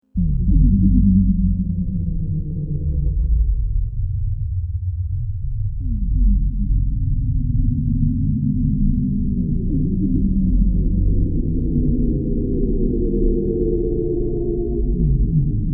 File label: Deep throbbing low frequency eerie Alien planet ambience; Deep Tones; Sound Design; Forbidden Planet